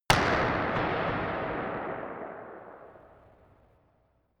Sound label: gun dark pop gun; schusswaffen